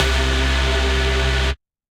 Bass; Datox Bass; Ableton; Build and Drop

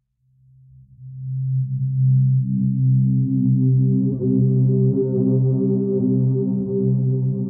Burnt Sun Pad; Instruments; Ableton; Drone Lab

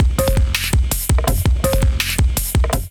Kit Melodic 165 bpm, Drums, Complex; MIDI Clips; Big Tune Fish Kit; Ableton; Factory Packs; Skitter and Step

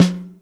SN1 Top, Snare; Ableton Foundation; Snare Designer

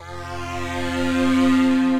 Strings; Nostalgia Strings

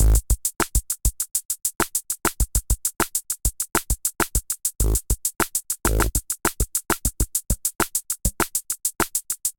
D55 100 Groove, Drum Machines; Modewipe; Ableton

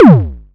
modular pitched, Kickbank; Ableton Foundation FreePacks

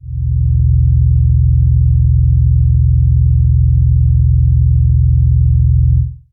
MPE Machine Noise; Experimental MPE; Ableton; Drone Lab; Laboratory

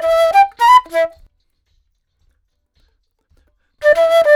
110 Chill Pop Flute Em; Pop Rock

